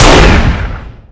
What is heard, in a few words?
rifle shot; svencoop